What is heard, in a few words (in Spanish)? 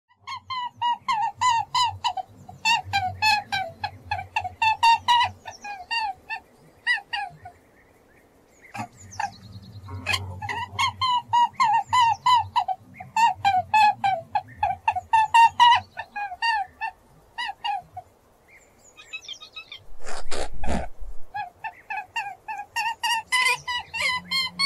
SONIDO DE CONEJO